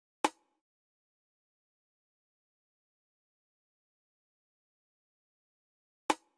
Drum Loop, 82 BPM Percussion; Cymatics; Vibes II Drum Loop Collection; Distance Drum Loop

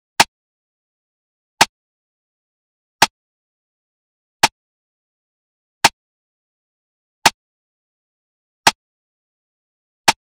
Drum Loop 85 BPM Rim; Loop Stems; Cymatics; Vibes II Drum Loop Collection; Quilt

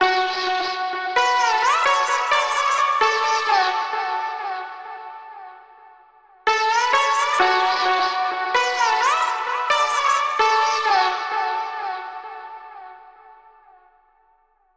Synth Lead Bm 130, Trap Loops; HipHop; Melody Kits; Ghosthack; Flowers 130 Bm

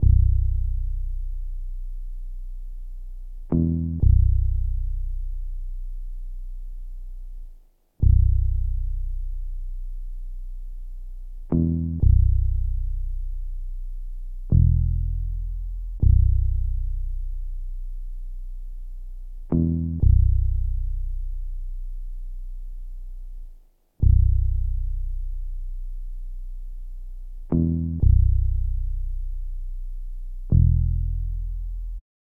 120 BPM B Min Bass, Trap; Melodies; Loop Stems; Bazaar

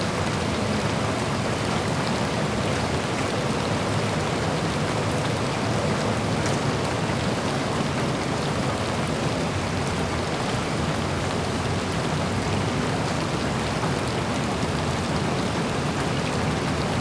Strong Rain; FX; Cymatics; Eternity Sample Pack

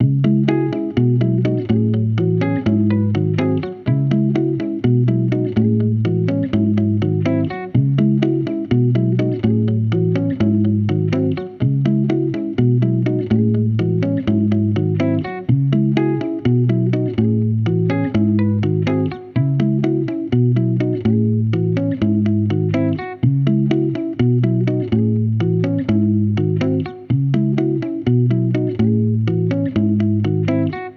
124 BPM E Maj, Guitar; Help; Cymatics - Destiny Premium Melodies (BETA); Guitar (Bonus Pack)

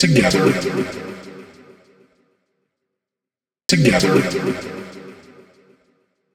Vocal Loop 130, Techno

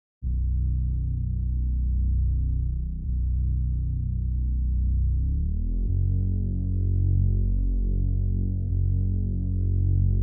85 BPM F Min Bass; Loop Stems; Cymatics; Rushing

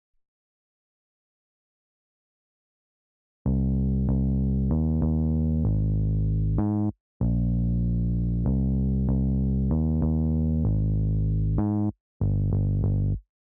96 BPM C Min Bass; Cymatics; Miami Guitar Pack - Pt 2; Rum

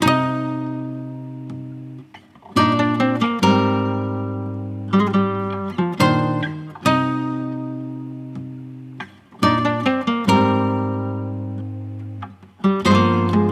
Open Spaces, 140 BPM C Min, Guitar Loops; Cymatics; Journey Guitar Loops

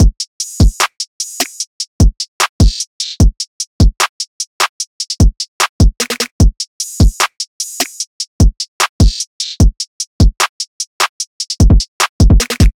Drum Loop 150 BPM; Konopio; Cymatics; Mystery Pack Vol 4

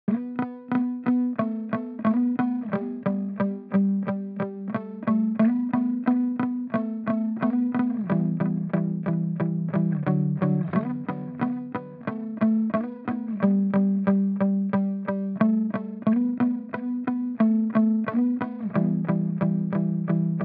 90 BPM E Min Muted Guitar; Loop Stems; Cymatics; Broken

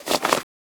Short foley

